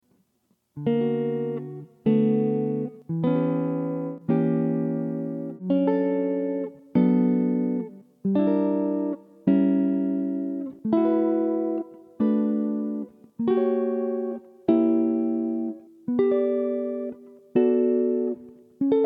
Maj 6th and Dim Chords borrowing on 3rd, jazz chords; Barry Harris harmonization